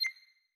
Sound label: back style 5; inteface sfx pack 1; Back tones